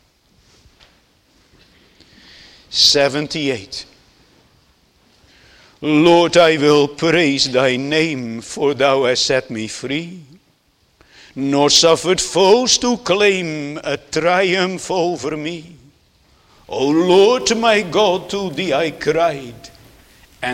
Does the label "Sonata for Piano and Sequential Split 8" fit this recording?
No